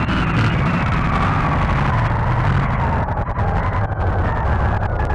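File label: engine decel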